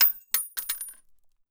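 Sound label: bullet case bounce 308 glass; gmod; viper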